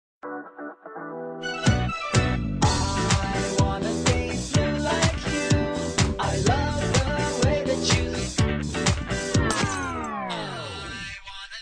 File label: android 3g msg, sms; ringtones